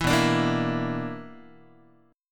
B-Minor Major 7th Double Flat 5th, Guitar; BmM7bb5 strummed; JGuitar

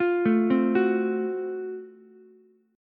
F sharp Minor Major 7th Flat 5th, Ukulele; arpeggiated

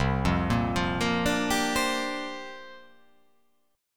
C 9th Suspended 4th C down

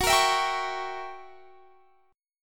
Gb 7th Flat 9th Gb, guitar

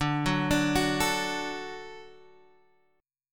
D Major D down Guitar Standard; D arpeggiated; JGuitar

